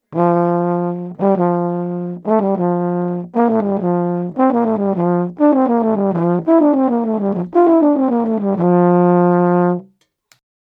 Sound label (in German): Tonleiterübung F-Dur abwärts Flugabone